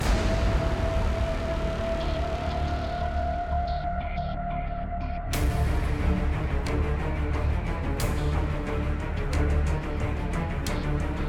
Battle Ruin castle

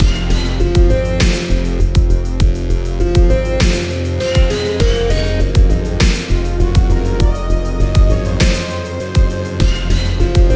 Tension Loop

